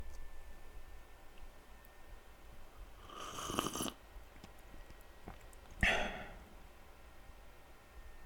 Slurp And Swallow

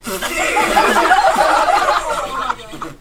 Small Crowd Laughter In A Small Room

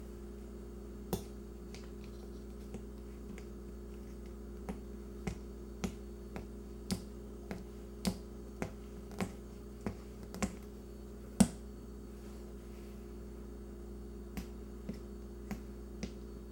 Doll Foot Steps

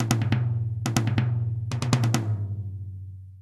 Drum Loops

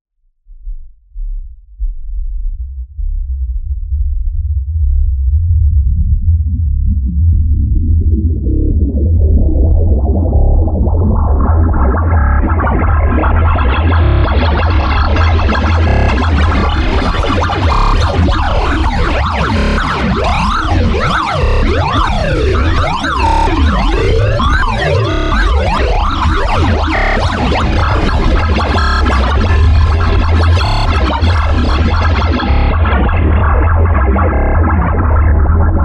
Futuristic Oscillated Synth Effect

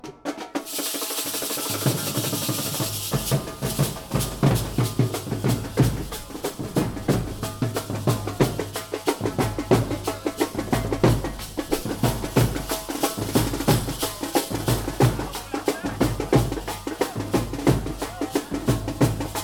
Bhangra Rhythm

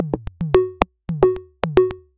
110 Bpm Fm Rhythm

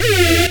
Hardhouse Hoover

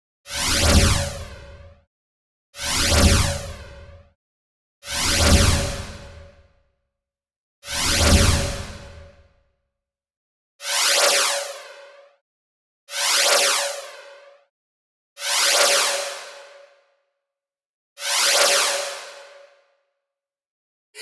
Sliding Trailer Woosh; Qubodup

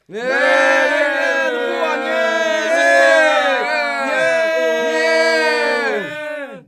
Group Disappointed

